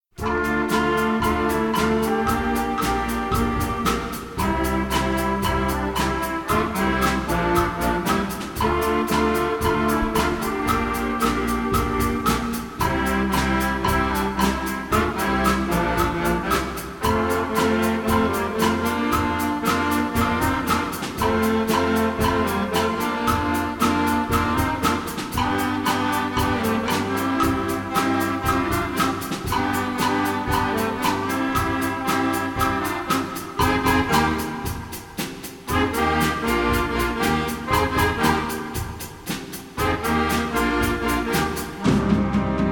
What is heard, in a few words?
farmhouse rock